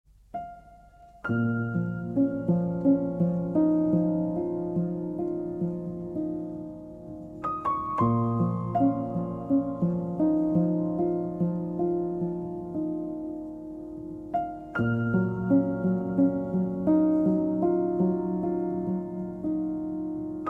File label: Piano Solo